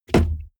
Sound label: car bmw e46 interior compartment close